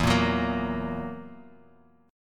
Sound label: E Suspended 2nd Flat 5th E down Guitar Drop D